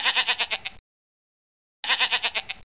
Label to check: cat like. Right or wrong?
Wrong